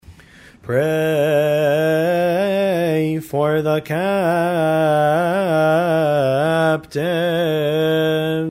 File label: litany of the captives gregorian